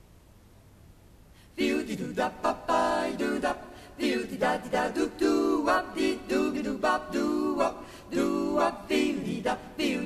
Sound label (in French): bonne nuit (impro vocale)